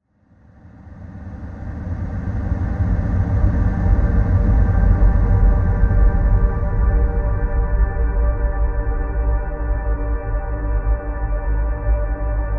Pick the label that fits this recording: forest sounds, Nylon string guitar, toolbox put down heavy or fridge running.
forest sounds